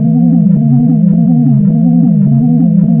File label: SFX Energy Shield; Twisted Metal 2